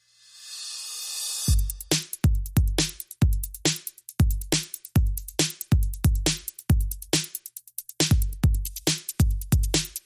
Bonus Beats

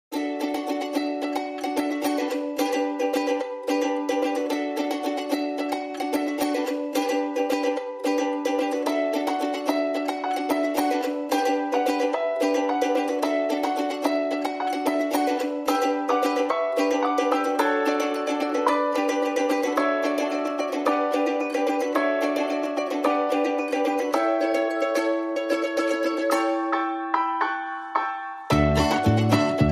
No Drums Reduced Arrangement

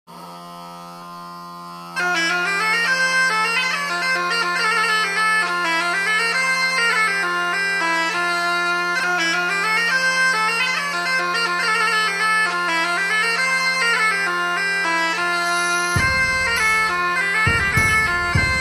Medieval Dance

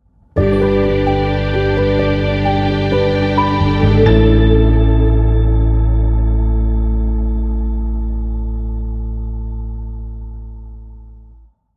Warm Embrace, Sting No Perc

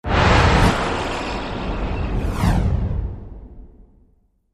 Sound Barrier

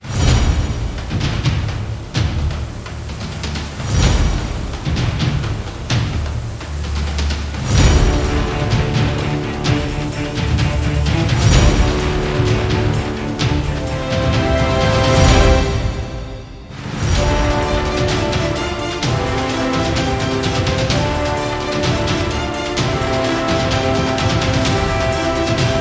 We Fight and Win No Choir

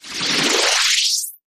Harsh Noise Sweep

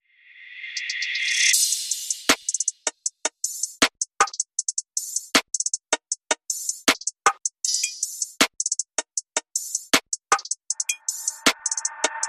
Drums Only

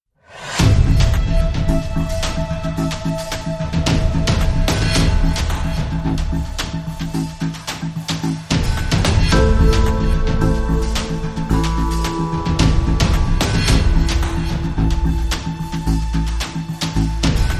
No Theme and Pad Strings

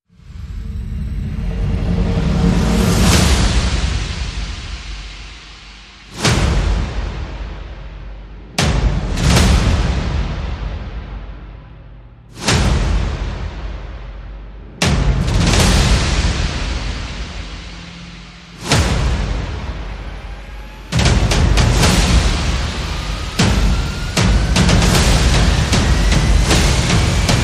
Percussion and Effects

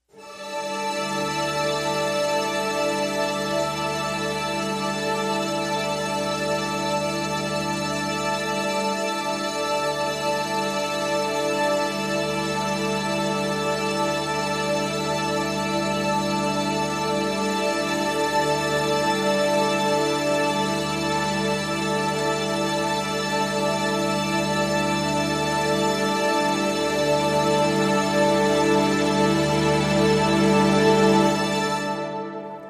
Strings D, Alt High

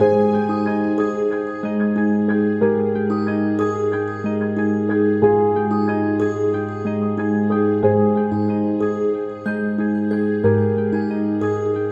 Open Mind, Loop No Drums

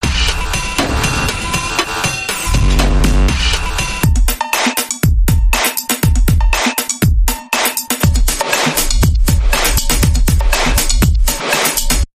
G Tech Dance Bumper

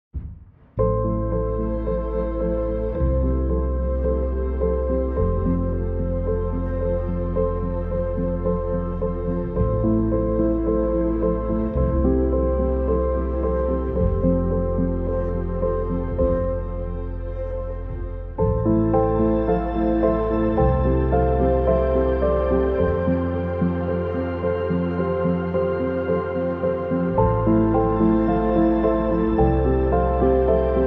No High Guitar

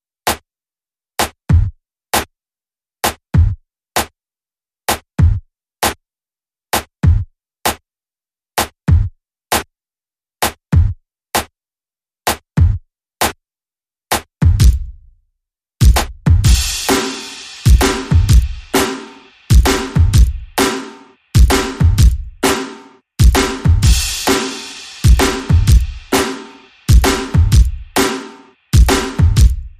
Drums

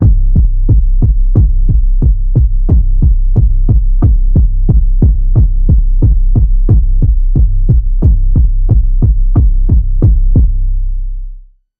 Underwater Pulse Wave C

